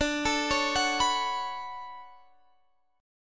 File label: D Major 13th D down, chord; DM13 arpeggiated